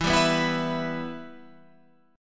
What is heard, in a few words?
E-Minor